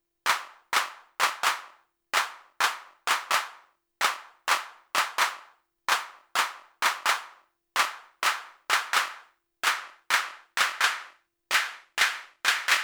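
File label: Clap Variation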